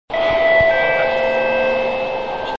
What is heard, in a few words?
chime